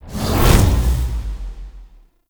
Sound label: Magnetic Field Cast; Warframe; Focus; Unairu